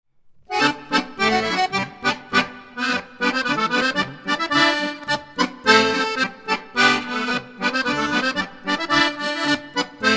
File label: swedish clap dance (instrumental)